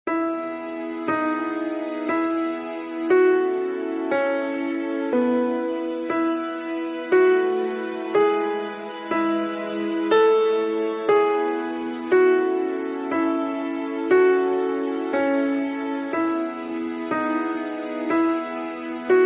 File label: piano melody; ringtone